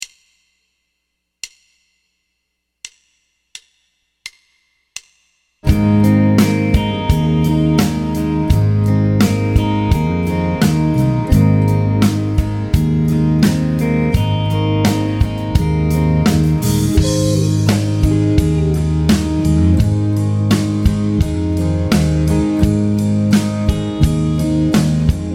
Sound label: Triad Vamp MAJ Ab; Triad Vamp Ab Major; Piano Practice Tracks